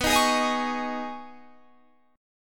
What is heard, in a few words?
B 6th Add 9th B Guitar Standard, chord; B6add9; 19,18,18,20,21,21; strummed; capo=2